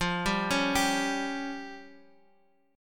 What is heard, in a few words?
F Suspended 2nd Sharp 5th F down Guitar Standard; Fsus2#5 arpeggiated